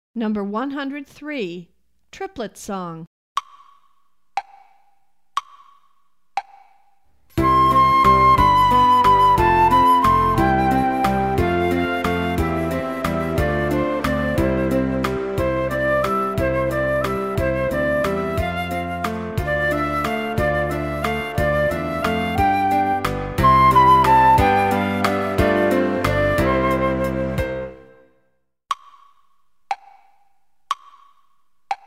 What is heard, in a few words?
triplet song